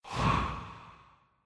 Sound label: EFX ANIMATE SHUFFLE, slots; Monkey Spin